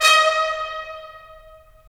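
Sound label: brass trumpets staccato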